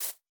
竹笋击打声

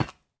骨块踩踏声

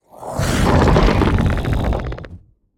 咆哮声; 监守者

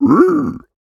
猪灵嫉妒声

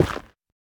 下界岩破坏声

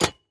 铜活板门开关声

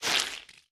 海绵 吸水声

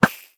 浮漂快速抛竿声

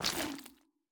幽匿踩踏声

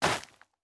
下界疣踩踏声

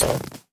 修复狼甲声

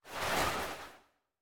旋风人滑动声

